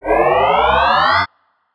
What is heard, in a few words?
sci fi weapon power up low; soundscrate